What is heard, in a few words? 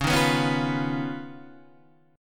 D sharp 7th Suspended 2nd Sharp 5th